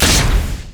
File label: twinbite dragon; LoL; shyvana